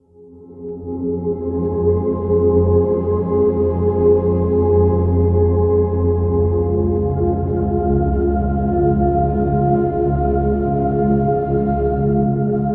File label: Galaxy Pad; OMS Sound